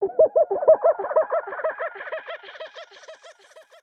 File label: VOICE GRL HOUSE 125 A, House Voice